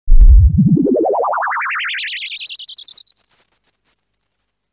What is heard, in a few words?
Laser Tune; Sound Effects Deel 1